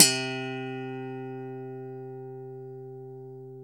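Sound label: guitar, funk guitar, Strats, clean electric guitar; Clean E.Gtr; PYB Strats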